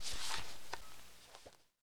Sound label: generic cloth slow, weapons handling